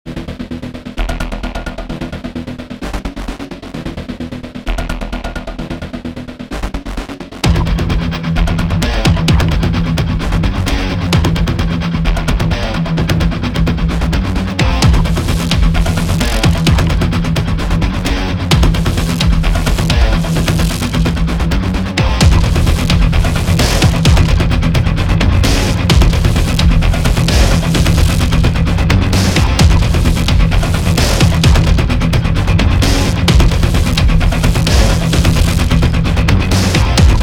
Heavy Riffs; Astro Flame: Starfighter; PS4, PS5, Switch, Xbox One, Xbox Series X|S

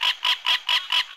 Hub Swamp Creature Amb, Swamp; Levels Hub Swamp

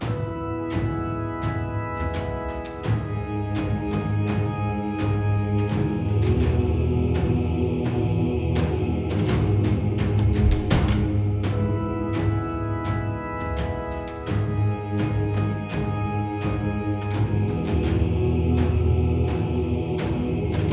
Caves Loop

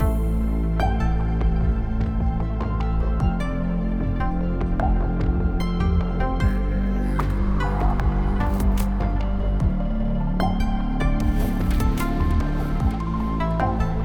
Kingdom Puzzle 8CH 75BPM

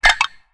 Totem Clicked

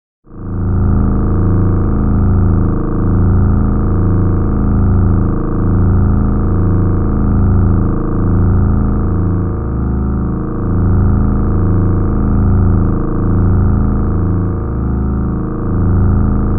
Sci FI Amb

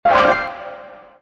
Horizontal + Vertical Line Combo; Cookie Jam

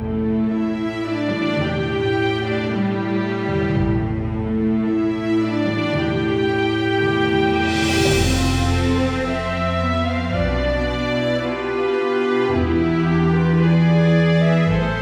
BGM; Tokyo 24-ku Inoru

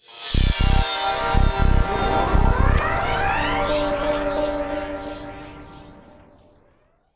Music Respawn

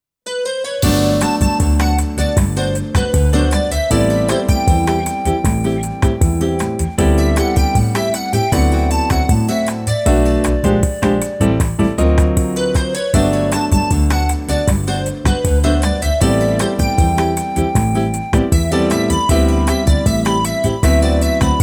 Afternoon Bossa

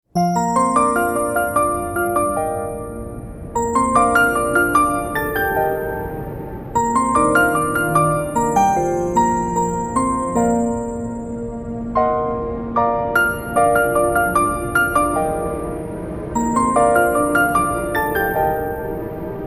Snow Piano

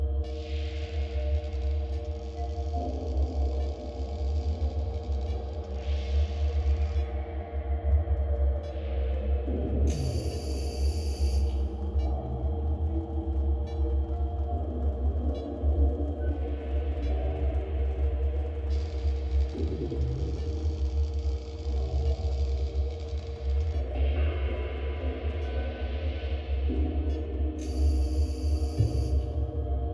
Station Background; Replikator

